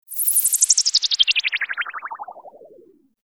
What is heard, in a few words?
INSECT, Special FX Twisted; USB Soundscan; AKAI